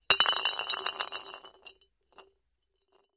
Glass Drops Rolls; bottles

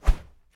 Baseball Arm Cloth W; baseball fx & games

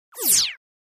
Laser Elect Pulse

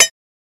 Bullet Shell Copper, shell casings fall; Bullet Shell On Copper Tubing Quick

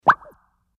Bubble Pop; bubbles

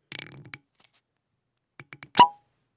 Opens, Pop Champagne Cork.

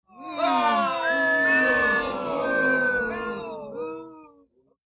Boos Small Crowd Booing

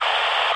Multiple two-way radio squelches and feedback.; Squelches, Radio Telemetry, Radio Static

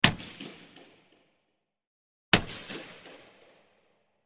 Hockey Stick Board Im, hockey stick hits board

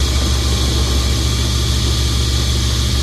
Table Saw Run Loop

